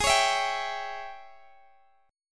Ab Major 7th Suspended 4th Sharp 5th Ab Guitar Open G; x,x,18,18,17,17; down